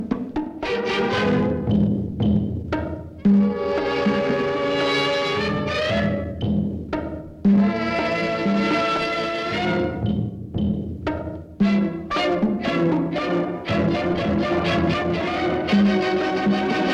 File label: Orchestral Title Music; dal mein kala